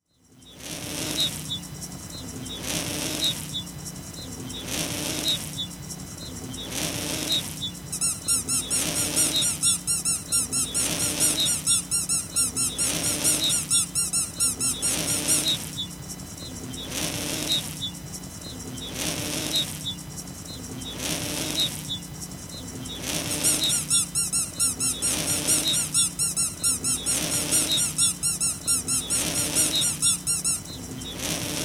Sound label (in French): bruitages